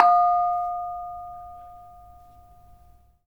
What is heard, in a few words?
KONGWONGLEK F5; Spectrasonics; Heart of Asia